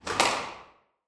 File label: sport squash ball hit against court wall